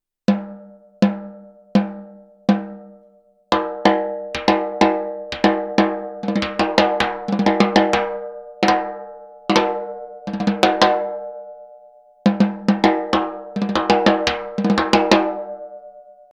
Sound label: Gretsch Bell Brass no snares